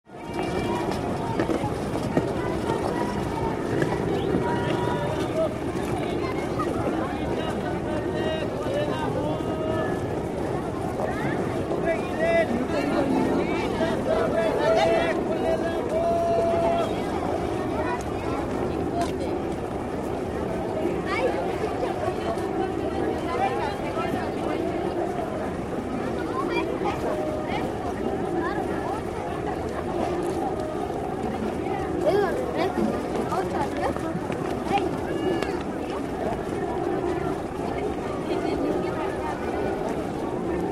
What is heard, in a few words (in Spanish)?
comerciantes de parque niños